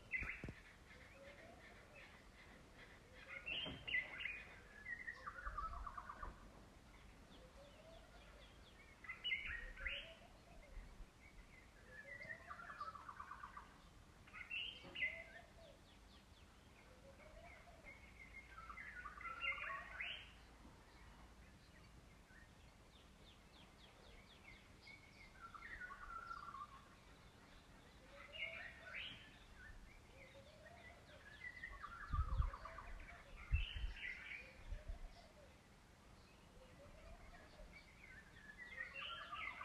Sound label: erongo birds namibia